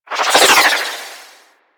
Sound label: Arch Wing Prime Dodge Roll Left; Movement; Warframe; Vehicles